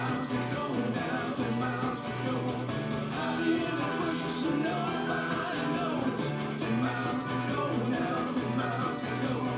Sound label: velcro